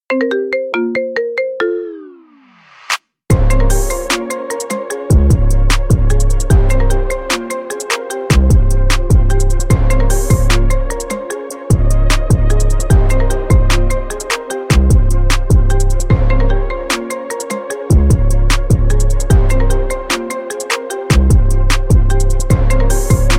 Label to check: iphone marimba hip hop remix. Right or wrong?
Right